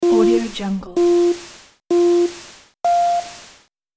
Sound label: ski game start countdown